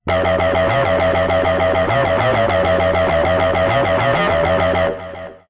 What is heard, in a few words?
Synth Mono; TSMtoolbox